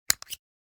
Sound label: camera lens cap; NCH Library